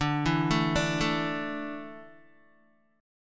D Suspended 2nd D down Guitar; arpeggiated

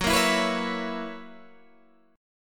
F sharp 11th F sharp Guitar Open G

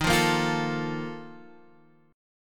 Bb Major 7th Suspended 4th, Eb, chord

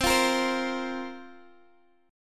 A sharp Suspended 2nd C

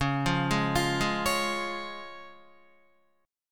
C sharp Major, Guitar; arpeggiated; JGuitar

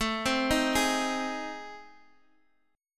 A Minor Major 7th Flat 5th, Guitar DADGAD

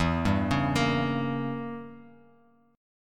E Diminished 7th E, Bass Standard

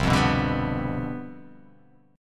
C Major 7th Suspended 2nd Suspended 4th, chord

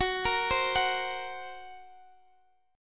Gb Major Flat 5th

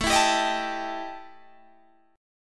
E Minor Major 7th Double Flat 5th

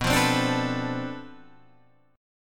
B Minor 7th Flat 9th Flat 5th B, guitar chord

